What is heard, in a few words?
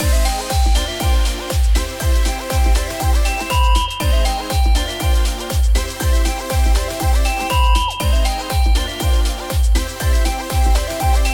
Grand Prize Loop, Kawaii Love Lofi Music, Stingers and Jingles; Kawaii Love Lofi Music Pack 2